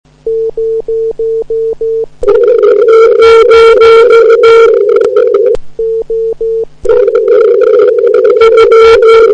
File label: echo test; ARRL2005; eme